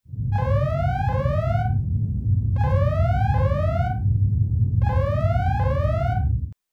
red alert

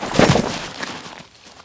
cover, combat; CosmicRage

